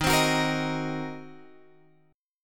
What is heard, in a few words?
D sharp Minor 6th, Guitar, Drop D; 13,15,16,15,16,x; chordshape